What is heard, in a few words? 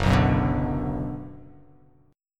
Gb Suspended 4th Sharp 5th; strummed; JGuitar